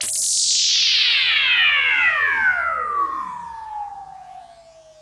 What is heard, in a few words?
Sparkling Fireworks